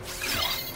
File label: Ultimatrix; Ben 10